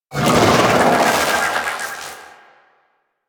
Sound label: Vocal Attack; Warframe; Sentients; Conculyst